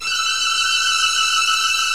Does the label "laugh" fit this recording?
No